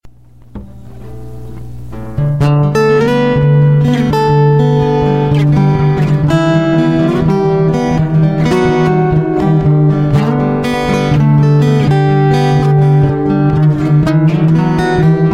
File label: noite instrumental